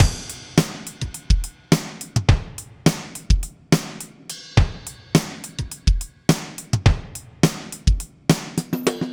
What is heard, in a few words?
Drumloops; MixterPlus